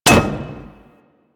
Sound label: Ship Hit Effects; Warframe; DE; Vehicles; RailJack; RailJackHitEffects